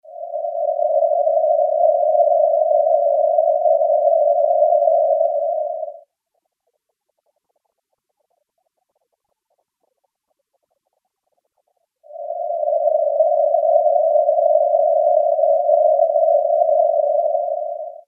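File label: noise 630 Hz; Magnitola Avtozvuk; shum pod fazy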